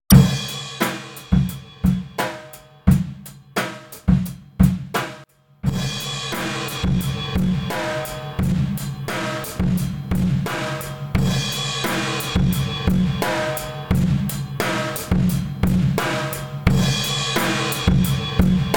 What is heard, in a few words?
attack time drums